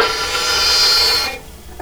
violin

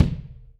Acou Kick, drum

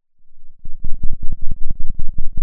pop, Sound of a thunderstorm

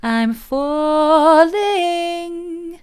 i'm falling soft, vocal

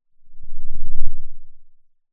Sound of a lion’s roar

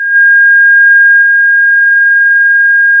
pop, sound of a tea kettle whistling

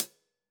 KHats Clsd

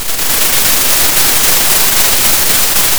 Sound of a thunderstorm

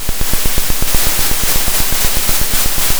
pop, Sound of a drum roll

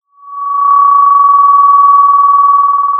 Sound of a phone ringing

